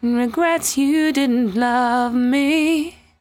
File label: 74-5bpm B, Velvet Vocal; While U Could; Zero-G